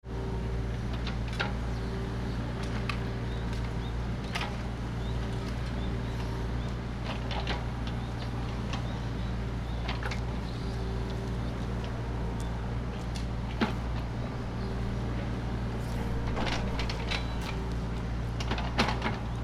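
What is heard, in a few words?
Fukushima Soundscape; In front of the building of Faculty of Symbiotic Systems Science; Koji Nagahata; fukudai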